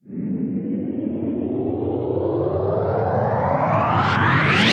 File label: Sweeps Up, Effects